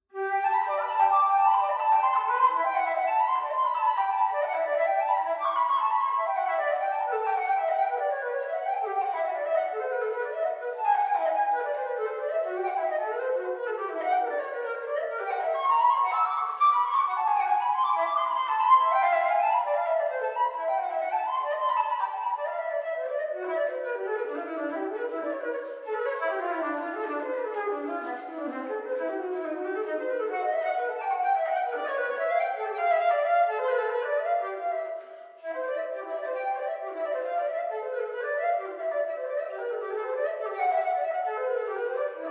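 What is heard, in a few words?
Prelude in D Major BWV850, harpsichord; JS Bach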